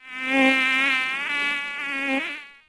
insect; DAoC

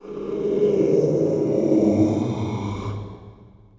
haunt; Thief II

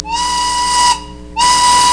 Lunch Whistle; Sound Library 2000; Wayzata Technology

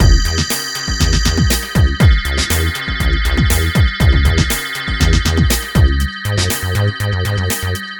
groovebox

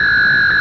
another button; Speed Bump

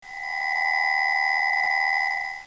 Beam

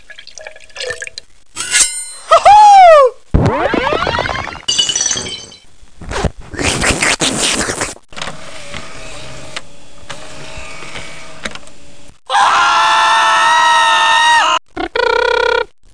repair

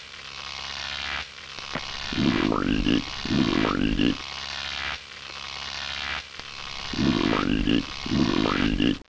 Frog; How Many Bugs in a Box; Lightbulb bug

